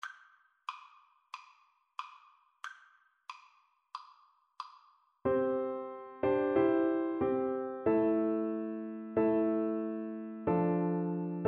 bleak midwinter, Alto Recorder

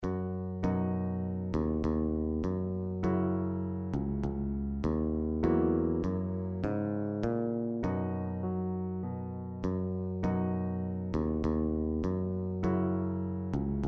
bass guitar; America

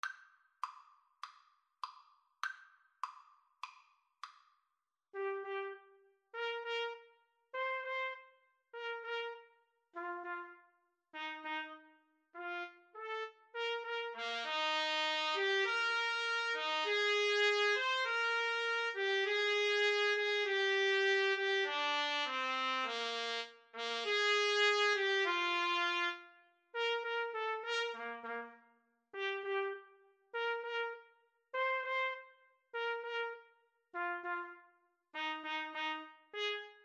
trumpet cello, Trumpet-Cello Duet

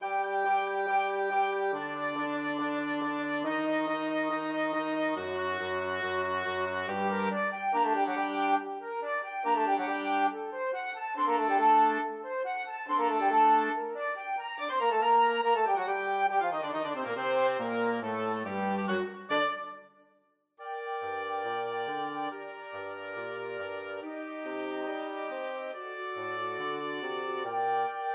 flexible mixed ensemble 4 players

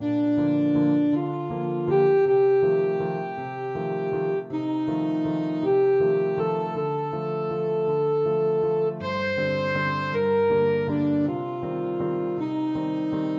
saxophone

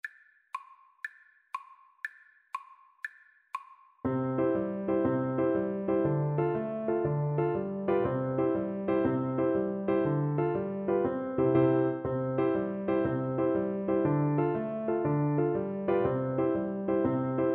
irish jig medley, Alto Recorder

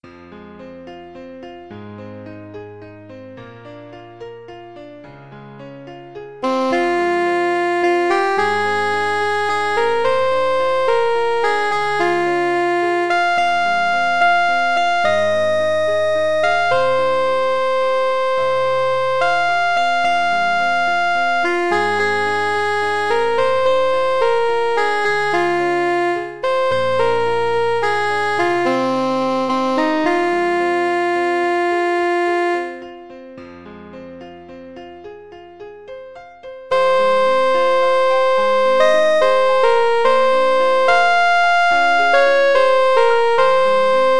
House of the rising sun, soprano saxophone

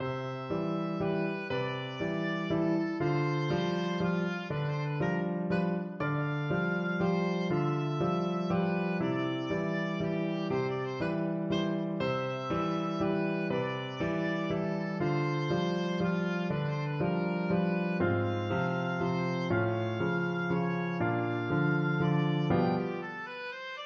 tchaikovsky flower waltz, oboe

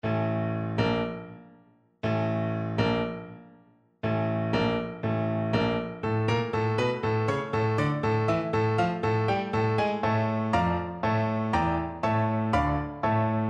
dvorak new, Bassoon

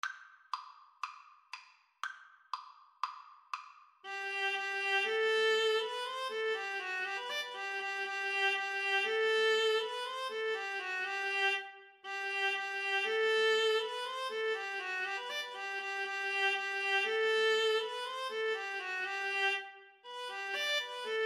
Viola duet

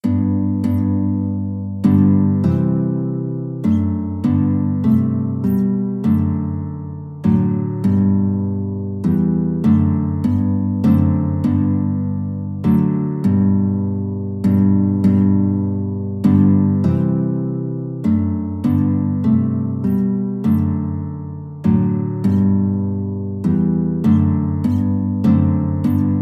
carol, guitar; sussex

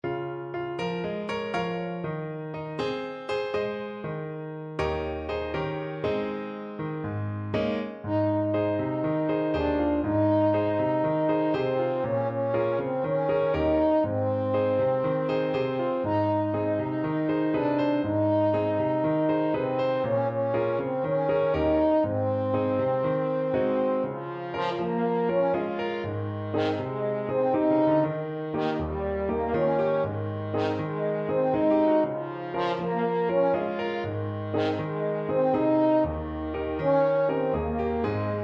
french horn

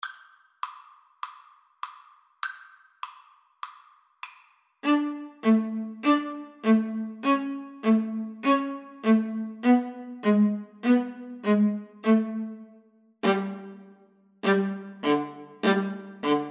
viola, Viola Duet

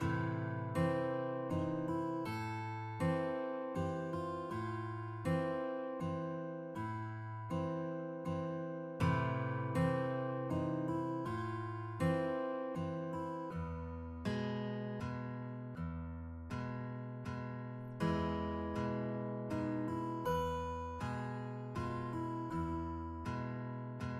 guitar, guitar duet

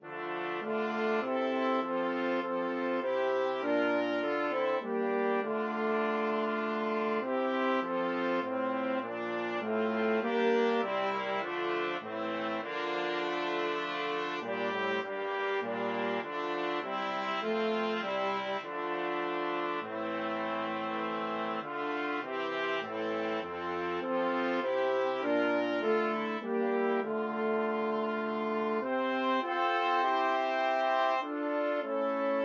brass quartet

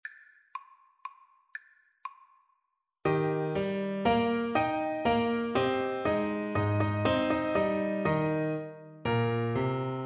German Dance, Trumpet; German Dance 1 K605

